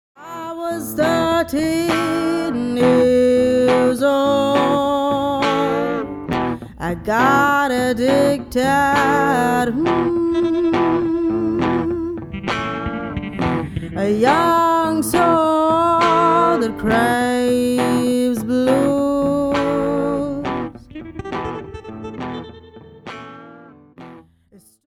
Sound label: Blues night